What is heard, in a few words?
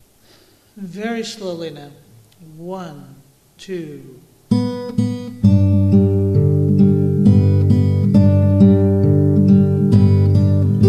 LULLABY